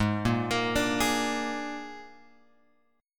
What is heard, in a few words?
G sharp Suspended 2nd Flat 5th G sharp, chord; G#sus2b5 Chord {4 1 X 3 3 4} arpeggiated